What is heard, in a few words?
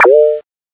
blip; hl2